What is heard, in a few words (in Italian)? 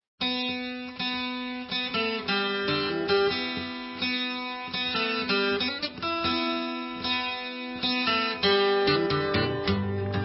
Un Amico (Versione Chitarra 12 corde)